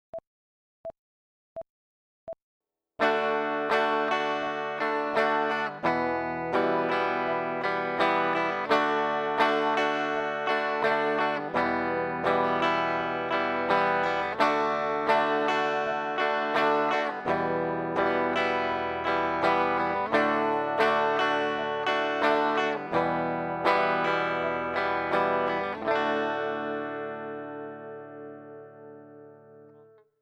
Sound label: Example 3 D to Em